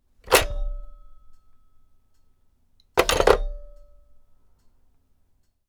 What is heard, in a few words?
Rotary Phone Handpiece Soft